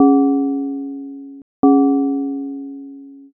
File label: gong clock